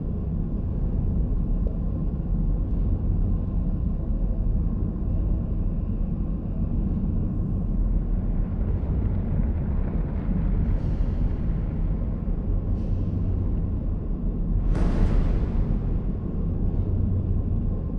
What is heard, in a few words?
ship mining station idle; aquatics